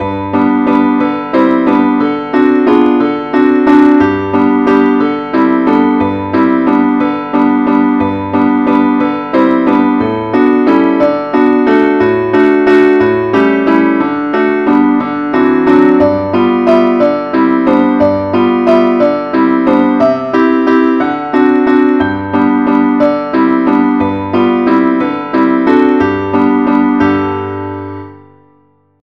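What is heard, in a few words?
angel voices advance accompaniment key G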